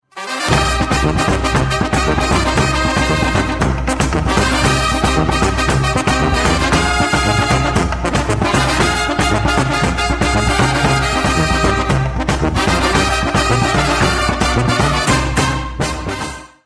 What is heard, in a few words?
tango ringtone